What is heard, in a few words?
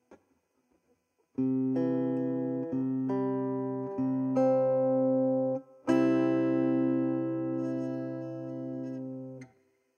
consonant ceg